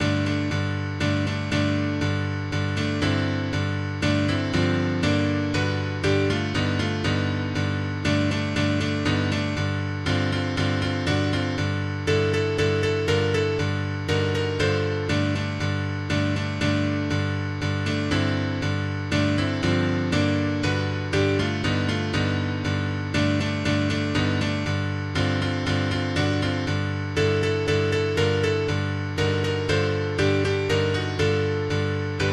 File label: March